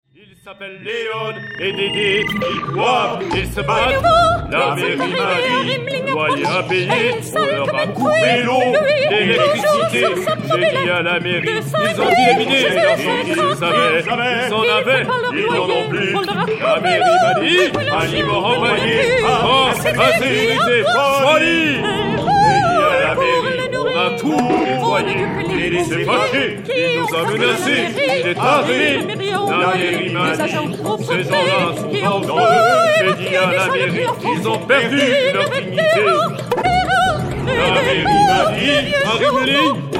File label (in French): La pompiste en choeur